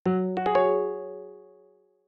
Sys Trash Emptied; Oxygen; plasma

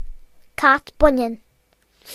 cat female; gcompris-data

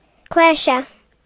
harp; gcompris-data

